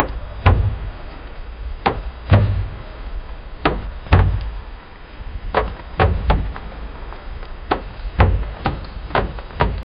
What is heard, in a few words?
door slam dhadam